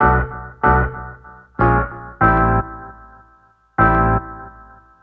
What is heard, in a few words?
Soul Jam Hits; Sonic Foundry (Sony Creative Software); Stylus Pressure Urban Grooves on Digital Wax